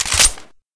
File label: p228 slide sil; weapons; kuilu